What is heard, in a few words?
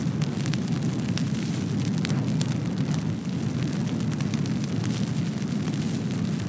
molotov rag fire loop; exp molotov